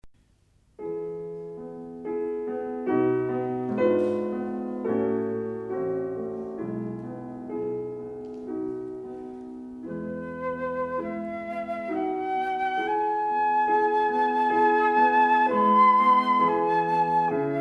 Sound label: arpeggione